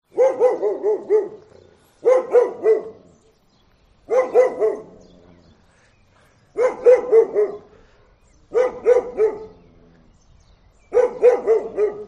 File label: real dog barking call tone